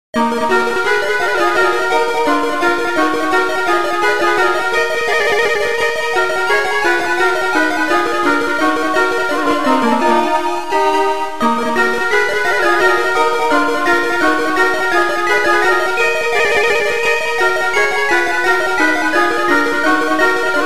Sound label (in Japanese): RPG風ファンタジー編 チャーチ教会